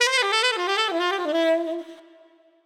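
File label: Widdly Widdly 4 beat D major 90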